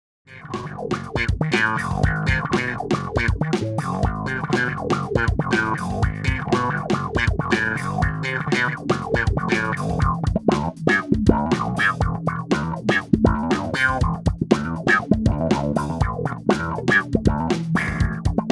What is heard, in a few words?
wah